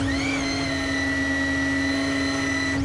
rocket turret rotation loop; Factorio

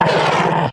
stomper warcry, enemies; Factorio

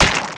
mining; cuttlepop; Factorio

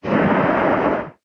stomper idle big, enemies; Factorio

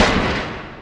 asteroid damage metallic big; Factorio

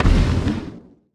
asteroid collision interstellar small; Factorio

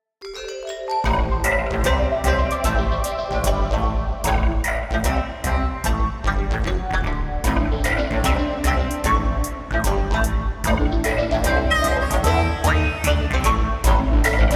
Boombox Tune; Trailmakers: Pioneers; Froggit

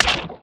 axe mining; Factorio

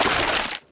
mined, mining; funneltrunk; Factorio

